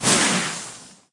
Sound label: Ice wizard atk; 寒冰法师